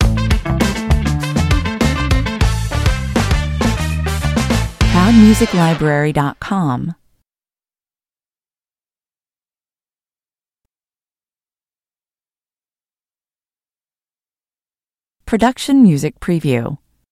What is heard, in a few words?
the funk stinger